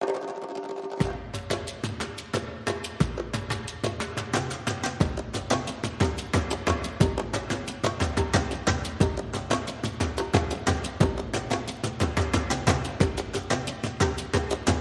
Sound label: Trailer, Percussion and Effects